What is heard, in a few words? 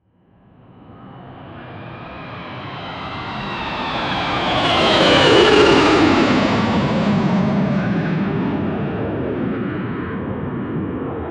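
a jet taking off, airport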